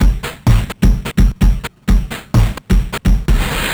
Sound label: 128, LOOPS DRUMS; Garlic; RoBKTA Sample Pack Supreme